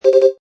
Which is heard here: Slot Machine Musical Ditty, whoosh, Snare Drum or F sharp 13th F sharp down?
Slot Machine Musical Ditty